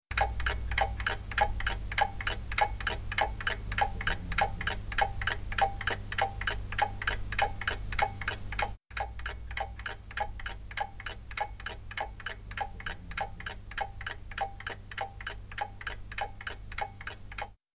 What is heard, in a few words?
noise